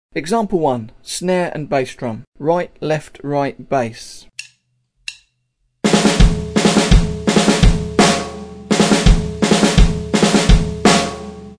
snare, drum set

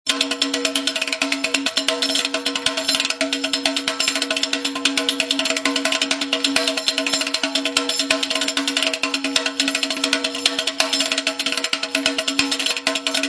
req jingle ruffs

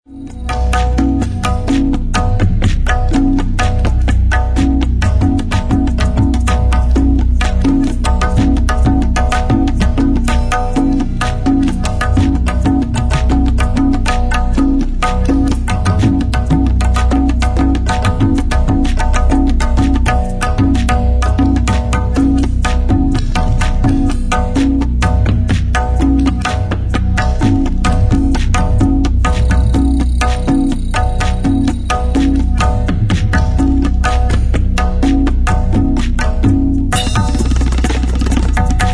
taiko tabla

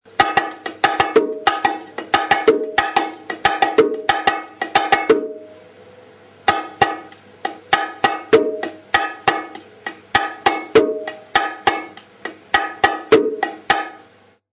SIMPLE MARTILLO, bongo

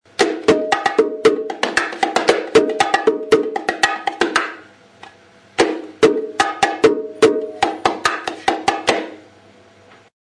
SLAP, bongo

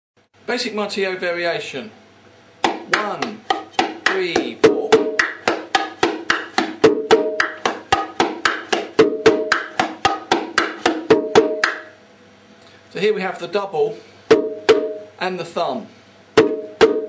BASIC MARTILLO, bongo

